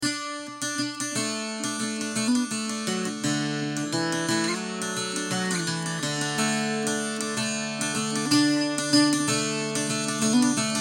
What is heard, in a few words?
parlour bouzouki